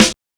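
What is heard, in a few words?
snare drum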